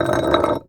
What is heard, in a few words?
SCRAPE Concrete Slab on Concrete Slab Short; mono; Universal Sound FX; SCRAPES & SCRATCHES